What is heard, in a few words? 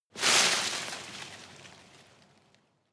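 tree small leaves hit, garten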